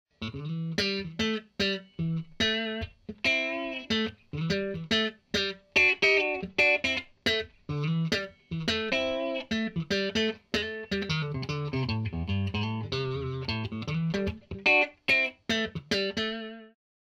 guitar funk; Funk 1

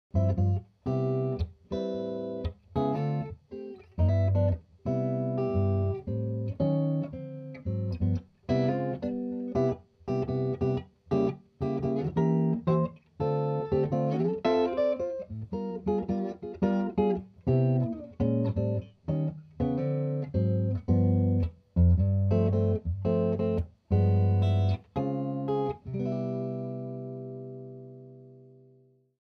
guitar jazz; Jazz 2

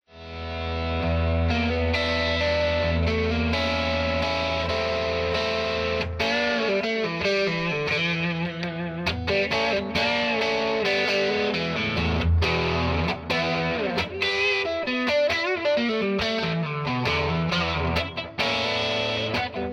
guitar blues

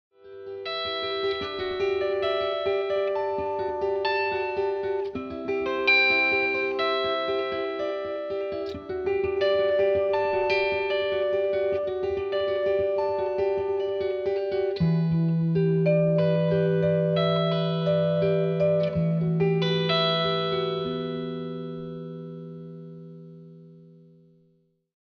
guitar harp

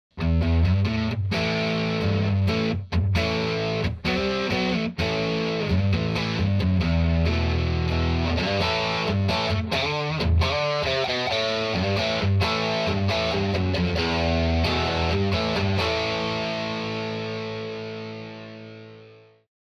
guitar rock

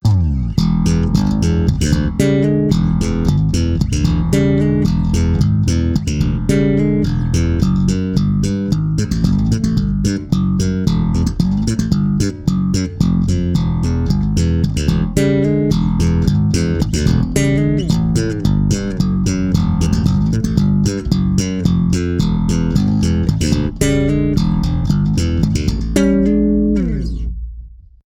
bass fat funk

